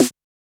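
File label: Electro Kit Snare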